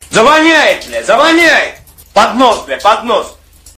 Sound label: fart; pvkii